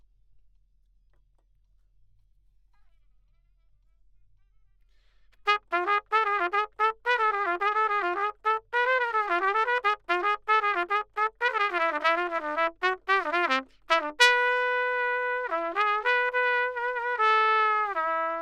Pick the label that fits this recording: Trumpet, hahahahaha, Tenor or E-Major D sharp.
Trumpet